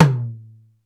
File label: BEAT KITS, T; Crypton - DrumBase5000